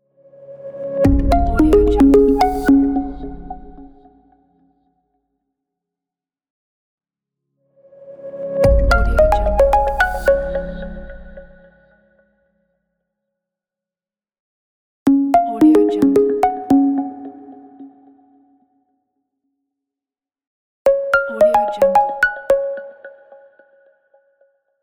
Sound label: logo happy